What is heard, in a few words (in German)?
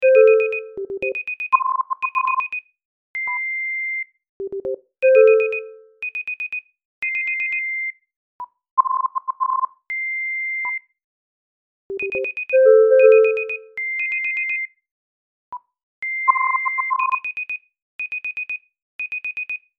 Funktionsklaenge Sounds